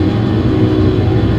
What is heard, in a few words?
hipcutter echo loop